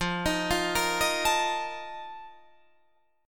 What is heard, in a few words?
F 11th F, down, Guitar Drop D